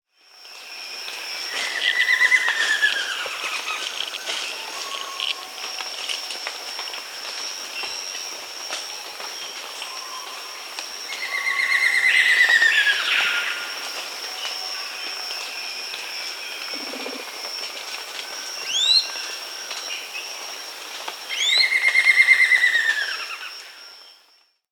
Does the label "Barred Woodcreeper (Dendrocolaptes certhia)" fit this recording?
Yes